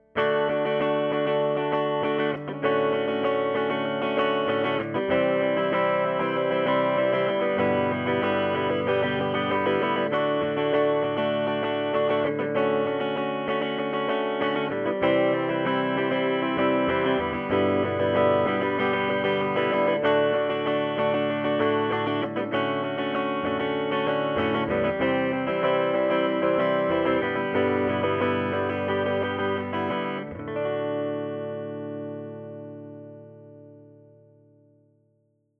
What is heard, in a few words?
Mic Comp Clean Guitar r92